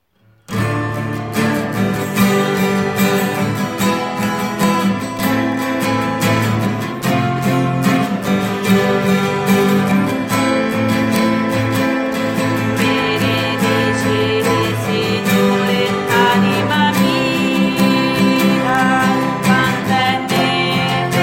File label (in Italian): Benedici il Signore seconda voce giusta